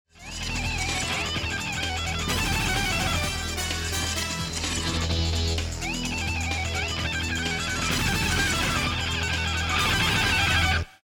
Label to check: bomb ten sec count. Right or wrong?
Right